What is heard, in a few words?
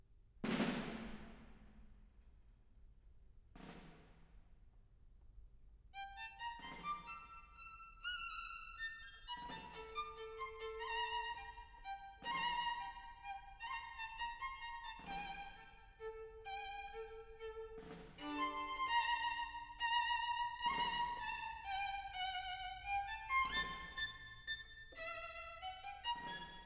for small orchestra, Allegretto; Shostakovich; Fragments (5)